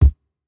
Jazz hop kick

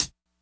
hihat; Pop; funkvol1

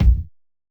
Hiphop kick; Reverb+EQ+Comp+Limit

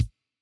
kick; Reference Beat BPM120; j city pop 1980

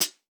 hihat, house

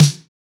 snare, snare house

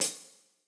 Rock hihat; Reference Beat

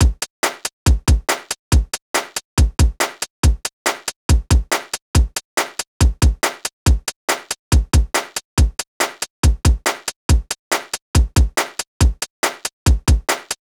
Electro loop; Reference Beat

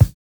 pop kick; japanese pop

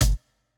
Jrock kick

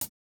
hihat, house

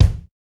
kick, house

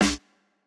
Jrock snare

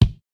kick, house

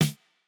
snare; Reference Beat BPM120; j city pop 1980

